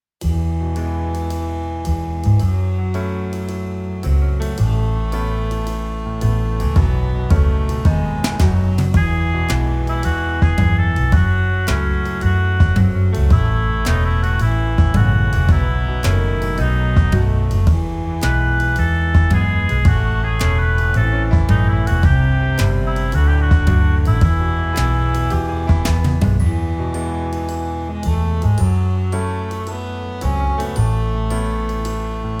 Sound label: BGM HomeTown 110